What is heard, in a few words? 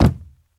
carpet land; Golden Crayon Sounds